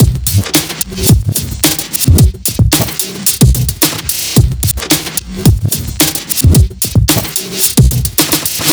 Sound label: LOOP 110BPM; Sound Design Tools; Loops